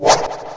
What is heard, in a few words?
cannon spear; classicro